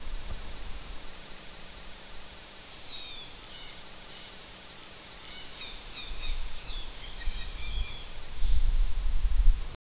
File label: forest wail trees